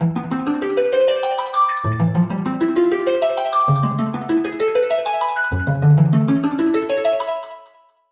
fake harp

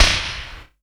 SMASH; Q Up Arts; Dream Experience

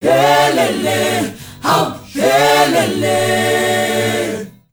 WEHLELE, MIX. CHOIR; Spectrasonics; Heart of Africa 2

